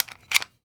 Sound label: Weapon Gun Rifle Military M1 Carbine Clip Slide Insertion; AdobeCC